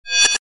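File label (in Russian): скрип лезвия меча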